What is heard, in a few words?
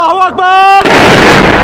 rip